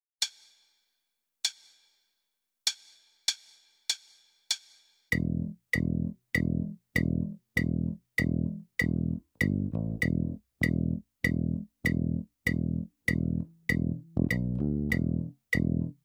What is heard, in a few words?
Rock